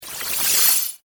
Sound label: Fantasy Magic Water Spell; Magic Sound Effects